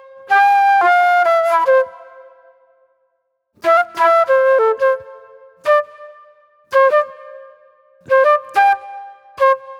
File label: Acid Jazz Flute